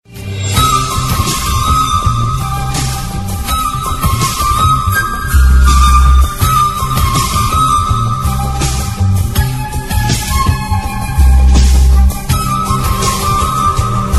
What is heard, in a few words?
flute with beat; ringtones; hip-hop